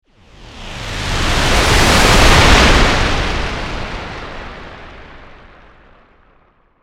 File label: Meteor Pass By, Science Fiction; 5.1